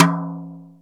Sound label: brush slap t, Jazz Brush